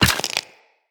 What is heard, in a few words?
Walk Bone, Footsteps; Operator